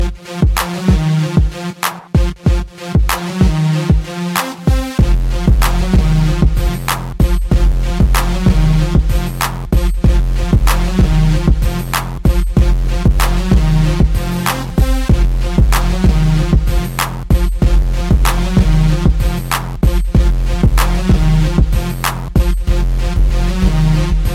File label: Full Bass